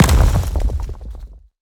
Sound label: creature wood footstep heavy; Bluezone Corporation